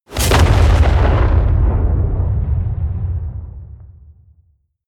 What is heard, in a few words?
detonation